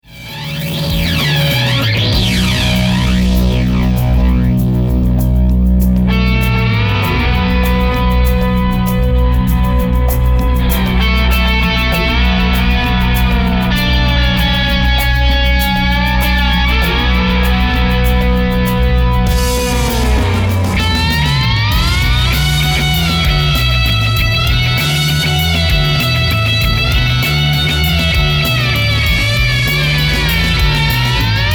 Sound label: solo; trabant